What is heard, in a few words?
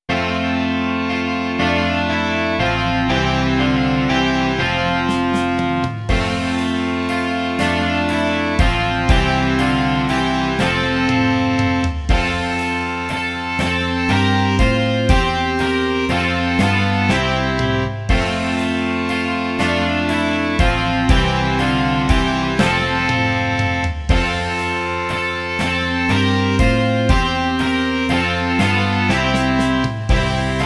rock